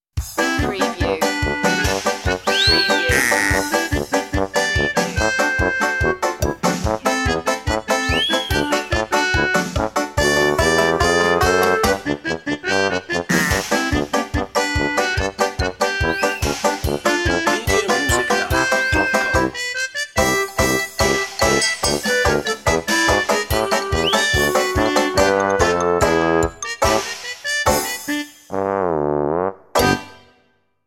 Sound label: slap stick duck